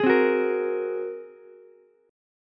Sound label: A sharp 7th Suspended 2nd Sharp 5th, down, Ukulele; strummed; chord; JGuitar